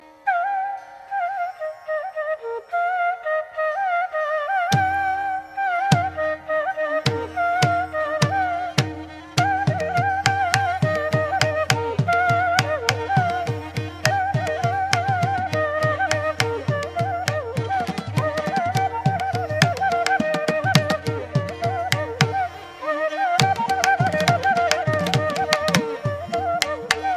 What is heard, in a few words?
vathapi flute; classical